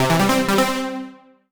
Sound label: Solo Warm Synth Positive stereo; MUSIC EFFECT; The Prometheus Secret Noohra